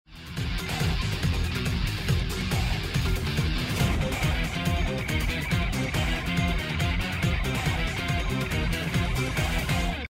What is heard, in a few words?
round ten sec count; music kits custom